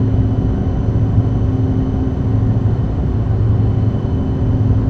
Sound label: ambience elev shaft